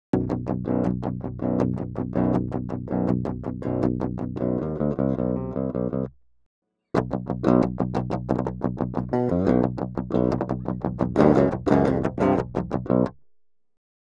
Guitar DI sample

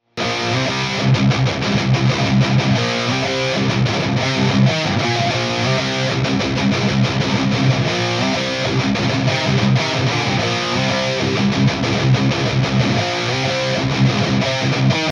Tone Test

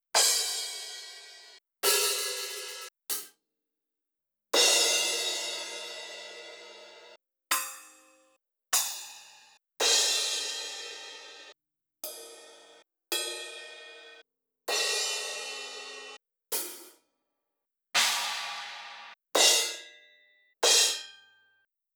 Cymbal; drum; cymbals

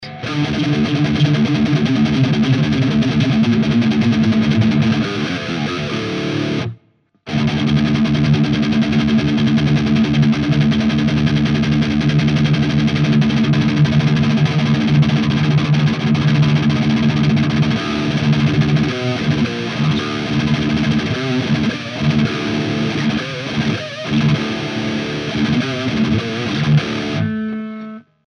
Triaxis Noizes, preamp; ADA

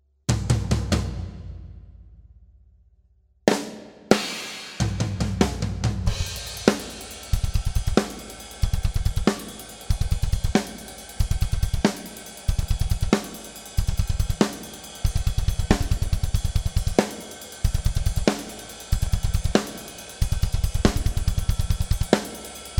Raw Drums; Raw drums before any EQ, compression etc is added

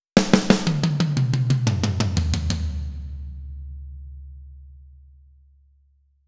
tease Fill Verb

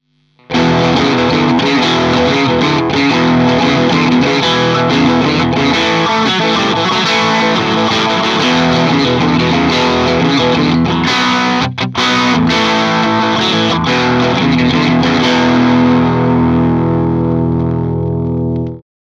strat; amp; Sub-Pre

